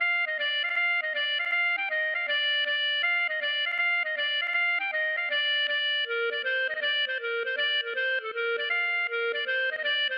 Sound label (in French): gavotte; Gavotte pourlet No6